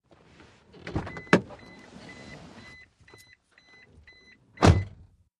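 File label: Mitsubishi Montero interior: Getting out of vehicle, with warning beeps; VEHICLES MITSUBISHI MONTERO: INT: Getting out of vehicle, with warning beeps.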